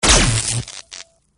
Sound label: bullet thunder thump; battlearray